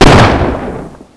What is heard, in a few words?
sb shotgun fire; weapons